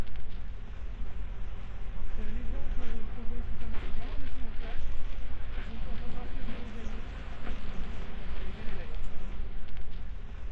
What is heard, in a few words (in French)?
plage bg